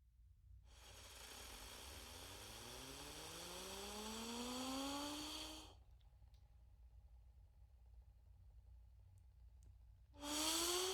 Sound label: ufo zip whistle